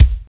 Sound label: go to load level; Tony Hawk's Project 8; XBOX